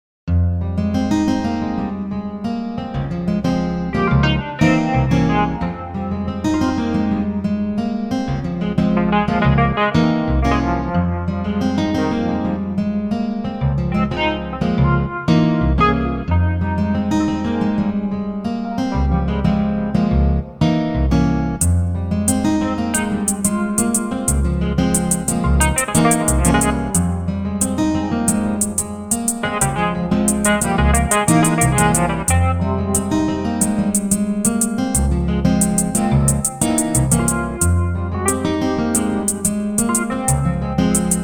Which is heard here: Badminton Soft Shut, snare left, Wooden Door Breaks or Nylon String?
Nylon String